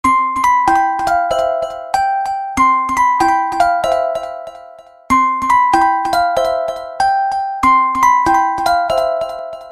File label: Sweet Samsung ATIV S Neo Ringtone